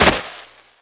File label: foot sand gritty; footsteps